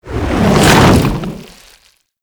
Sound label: Infested Bone Blade Melee Swing; Warframe